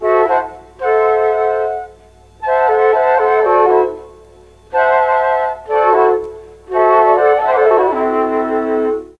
A3 CHA FLT, mellotron

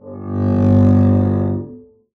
arco g1 ff down, double bass

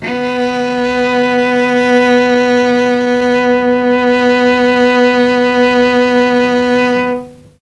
B2 300 CELLO, mellotron, STRINGS

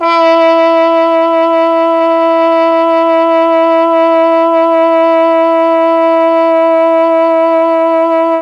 E3 TROMBONE, mellotron; CHMB TRMBONE

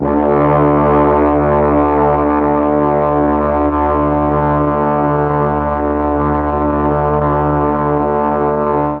D2 brass, mellotron; HALFSP.BRASS

